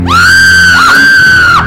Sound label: Screem